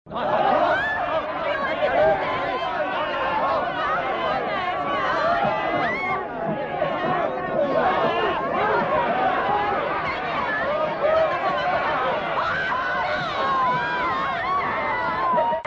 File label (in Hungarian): sikoly